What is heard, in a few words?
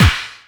KIT HOU; Best Service; Dance Mega Drums